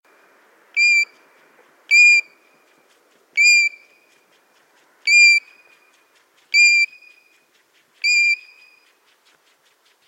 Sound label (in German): Goldregenpfeifer; Niels van Doninck